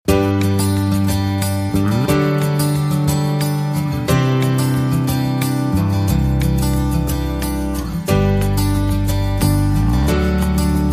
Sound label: Guitar, Rhythm